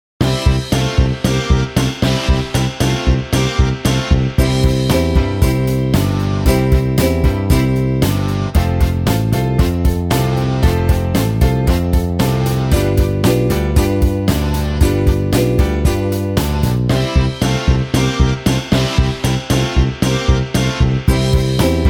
ukulele jam track; fun music company

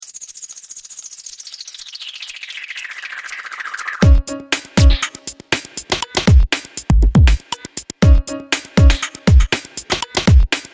keyboard rap fast

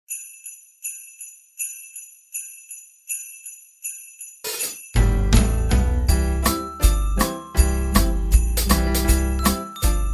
Jingle Bells ukulele 160bpm

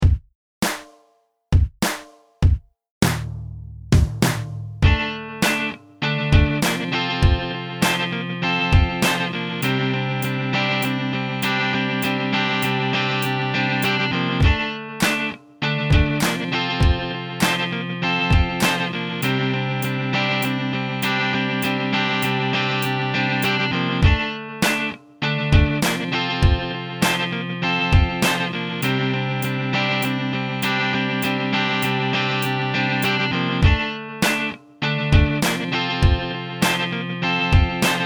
bucket beat slow; junk percussion

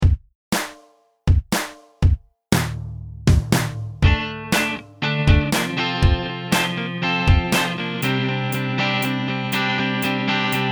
bucket beat fast, junk percussion

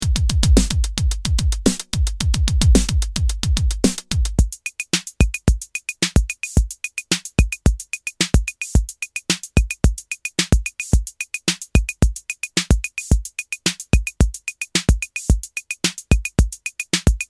echo clapping track no clapping

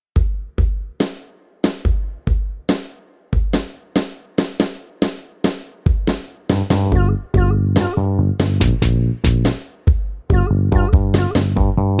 hip hop instrumental

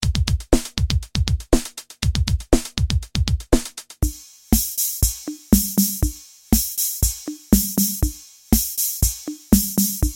boomwhacker backing beat mix

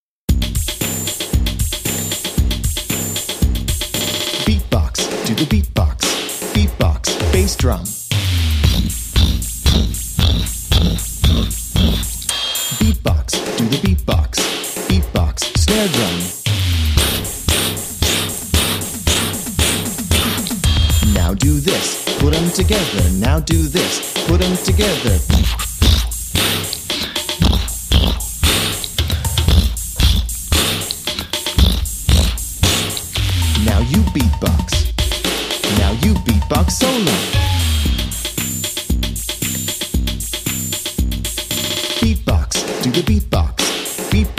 Do The Beat Box with vocals, beatboxing; Fun Music Company

